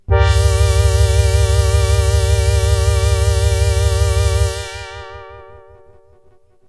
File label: SYNTH Trumpetti